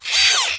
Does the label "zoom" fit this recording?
Yes